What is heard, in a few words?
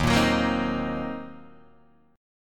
Eb Minor 6th, Guitar Open G